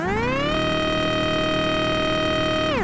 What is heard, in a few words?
scanner open